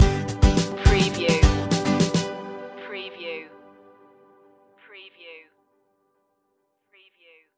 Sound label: coffee break stinger